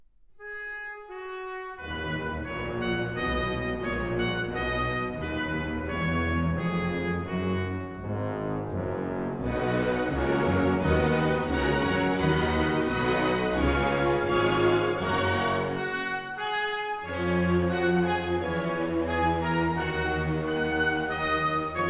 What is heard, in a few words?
Pictures at an Exhibition, for orchestra; Musorgsky; orchestrated by Ravel; Prom